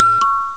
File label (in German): klingel; Amiga Soundtracker Sample Packs